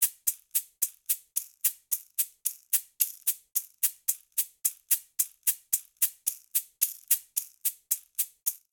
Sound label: Ballad Shaker 110bpm; Latin Percussion; Ableton; Factory Packs